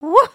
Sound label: breakdance woo, emotes